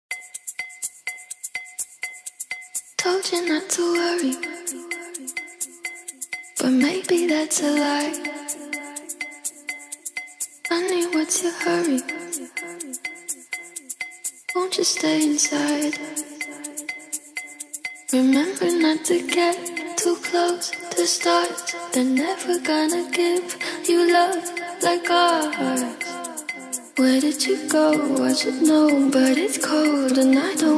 afro house

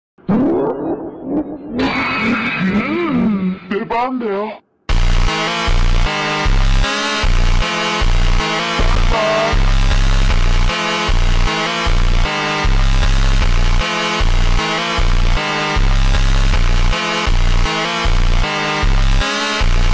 automotivo slowed reverb

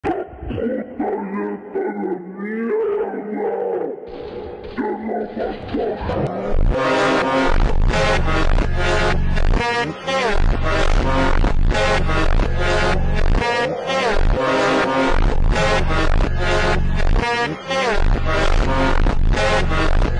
funk ultra slowed

